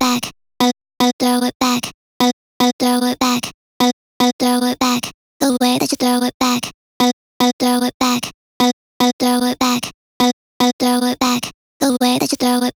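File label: 150 vocal chop loop throw it back dry B minor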